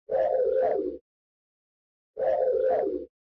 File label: Radar; Kitt; knight rider